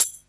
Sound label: HH, Dance Mega Drums; Best Service; HH-HIP